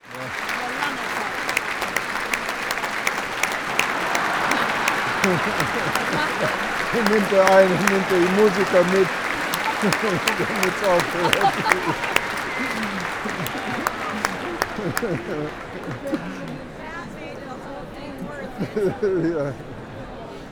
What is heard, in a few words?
applause and inverted